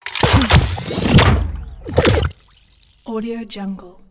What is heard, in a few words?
future weapons venomspitter reload; future weapons 3; soundmorph